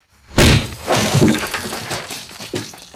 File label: heavy wall crash with falling debris; destruction